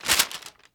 item paper pickup; Counter-Strike Global Offensive

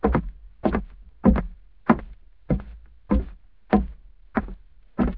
footsteps wood, materials; Garry's Mod; hl2

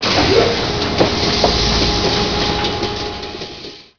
scaffold up; mcv; Emperor - Battle for Dune

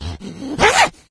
become alert, male, infected

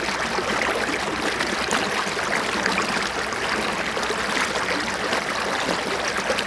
Bubble Water; GrandFantasia

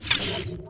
open crate; Emperor - Battle for Dune

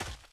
grass, footsteps, run; Left 4 Dead 2

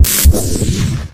weapon disintegrate; Garry's Mod; hl2; citadel

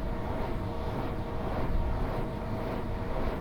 hum; AssaultCube

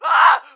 burn dying; Emperor - Battle for Dune

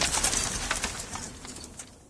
debris, weapons; Left 4 Dead 2

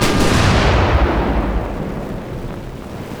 super heavy battleship damaged attack; Hearts of Iron IV Death or Dishonor

